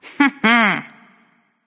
heh heh; smite

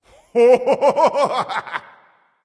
laugh; cupid

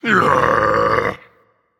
Ugh, Dragon; fafnir

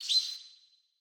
land bird; smite; chaac

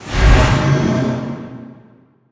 ghost excorsize; smite; zhongkui

